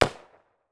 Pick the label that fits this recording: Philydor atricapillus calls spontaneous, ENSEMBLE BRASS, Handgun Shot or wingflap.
Handgun Shot